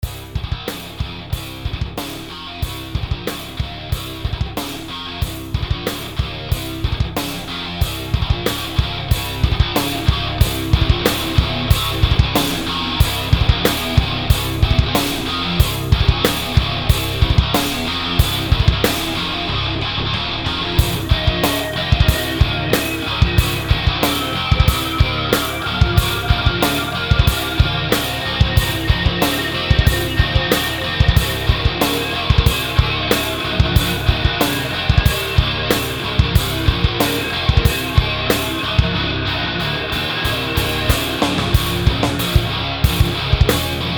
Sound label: trap